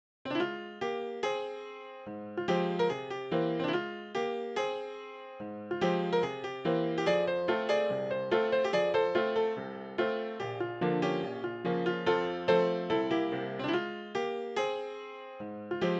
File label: blues; remorse